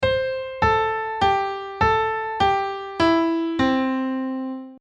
Major Scale

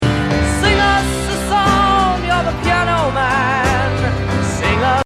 Sing us a song; chord quiz

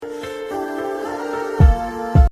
Aah Aah Aah; up or down quiz

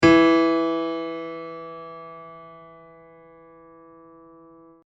Find Pitch, piano; finding starting note piano quiz

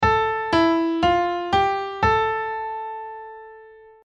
Minor Going Down; minor key going down piano quiz

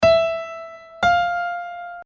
Interval 8

